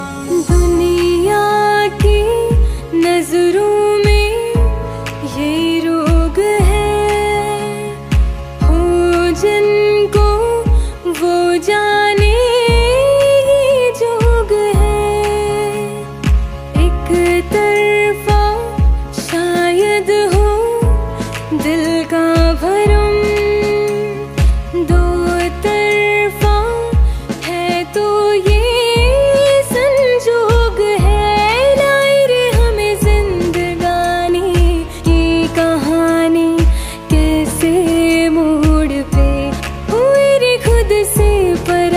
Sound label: Female Version